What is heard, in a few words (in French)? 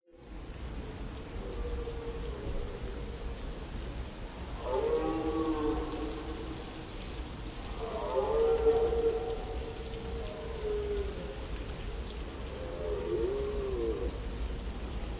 brame